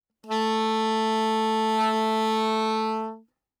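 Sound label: Soprano Saxophone non vibrato ff A4; Woodwinds; Bb Soprano Saxophone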